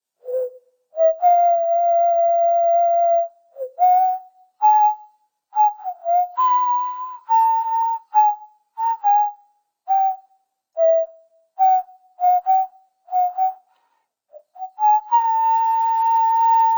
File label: dance whistle; LoL; janna forecast